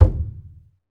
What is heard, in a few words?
Skin Drums; Roland LCDP14 Africa VOL-2; Af.Hand Drm2